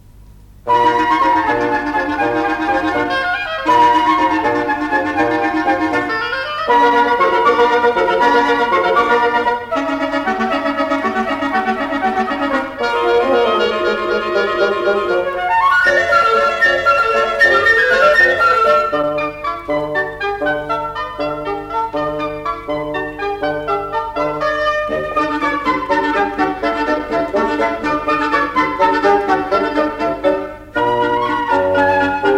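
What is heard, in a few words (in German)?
Holzbläserquintett, Allegro vivo; schwaen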